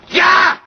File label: attack claw; amnesia: the dark descent; madness archive; madness-ch03-demo; enemy; hater